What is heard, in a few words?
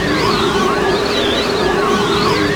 Tornado Loop; Destruction